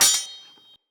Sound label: Dagger Impact Block; Weapon Impacts; Daggers; Combat